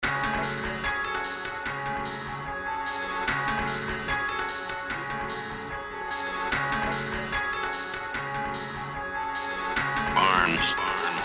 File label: Instrumental